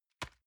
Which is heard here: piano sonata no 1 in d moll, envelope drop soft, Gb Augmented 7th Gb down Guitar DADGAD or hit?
envelope drop soft